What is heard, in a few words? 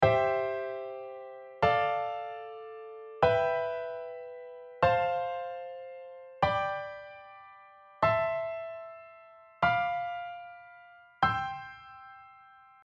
oct chord